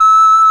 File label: HRP RCDR&FLT